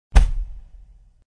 Falling Parts; Duck Life 4

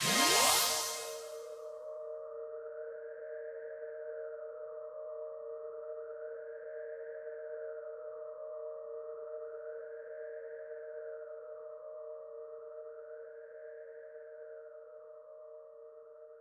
zap

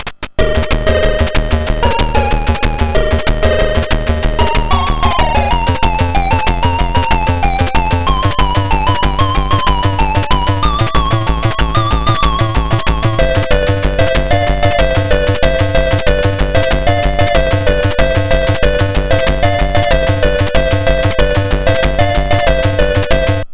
In-Game BGM, Atari ST; Sky Shark; Flying Shark

